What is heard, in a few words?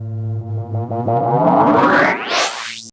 HARD RING; Spectrasonic Distorted Reality 1; BIZZAROS